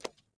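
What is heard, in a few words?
concrete run right, footsteps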